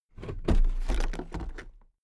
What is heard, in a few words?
toolbox open regular